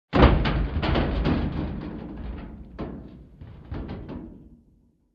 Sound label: Metal Hit Bang Sound